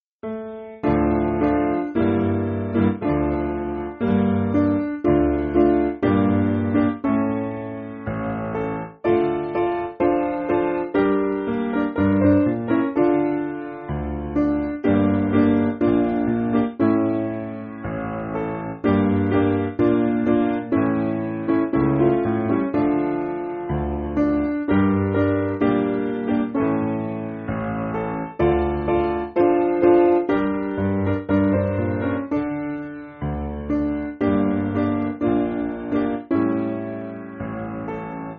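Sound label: Piano, Backing Files for Churches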